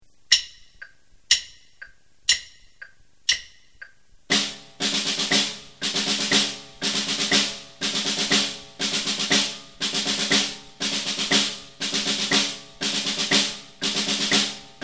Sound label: 5 stroke